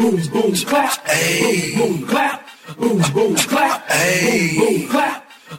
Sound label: boom boom clap hey; Drum loops